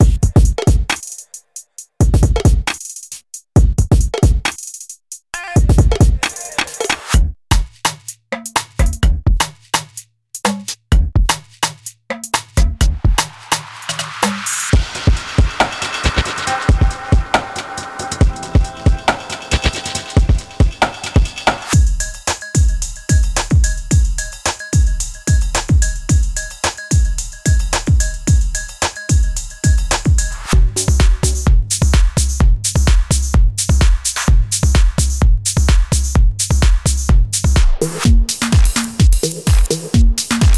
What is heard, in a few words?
Drum loops; Bring me the beat